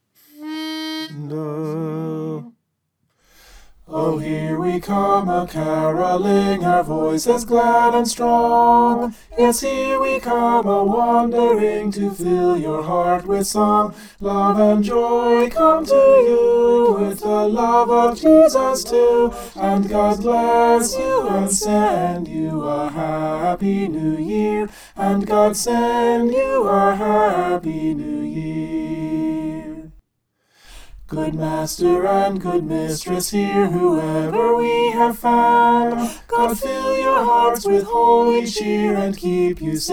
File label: soprano